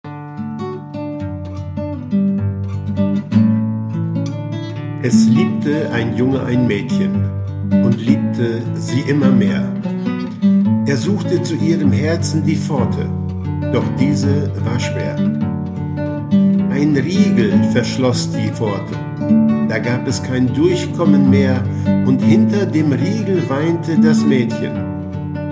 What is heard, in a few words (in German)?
Junge Maedchen Gitarre